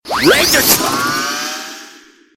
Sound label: Laser